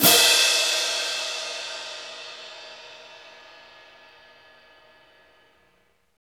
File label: CYM ORCH, Orchestral Perc; Roland LCDP03